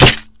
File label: hook, tripwire; hl2